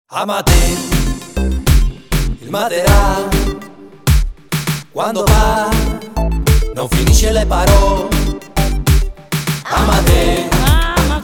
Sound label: ringtone